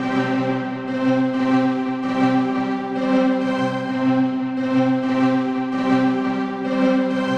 130 C string, String Loops, Trance; Trance Explosion Vol1

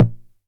909 SD Tape

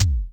BD ELEC, Electric Kick, Yamaha DTXpress

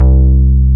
X-PAND, Synth Bass; SYNTH-Basses; Sound & Vision; Gigapack I CD 2

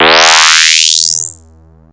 LFO Impact, Analog; Industrial; LFO FX

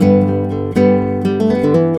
GUITAR 120

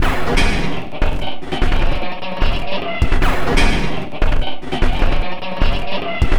Loop, Esoterik Beatz; Sonic Foundry (Sony Creative Software); Agrymet

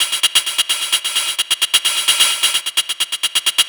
Fill, Drums, Eclectic Electronica

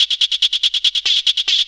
Hat 142 BPM, Club Techno, Drum Loops, Hat Loops; Zero-G

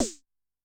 S Ana, Snare; Yamaha DTXpress-II

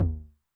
Low Tune Med Decay, 909, MID TOM; classic machines; 909mod; MT - MID TOM

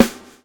SWNG

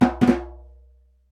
conga licks; Roland LCDP14 Africa VOL-2; BTS Conga Beats

